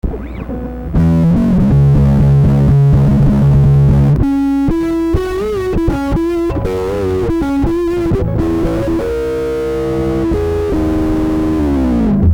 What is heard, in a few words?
overdrive pro 2